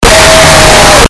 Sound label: SCREAM